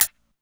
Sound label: closed-hihats, techno; Techno ID; single-drums